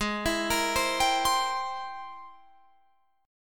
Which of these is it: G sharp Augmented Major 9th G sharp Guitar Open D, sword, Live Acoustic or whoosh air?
G sharp Augmented Major 9th G sharp Guitar Open D